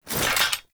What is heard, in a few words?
Generic Foley; Warframe; Warframes; Hoplite